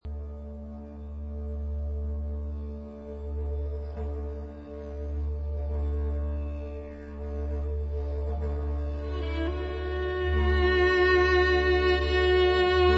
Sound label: mystical violin